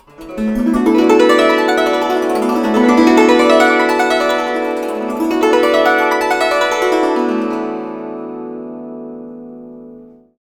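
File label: guzheng; Spectrasonics; Heart of Asia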